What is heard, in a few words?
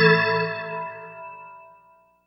organ (A); Sonic Foundry (Sony Creative Software); Ugly Remnants VOL-2